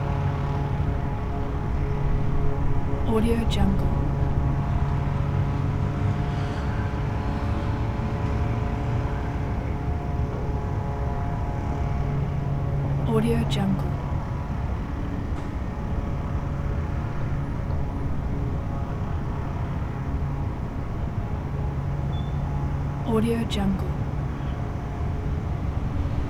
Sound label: distant city traffic; prosoundfx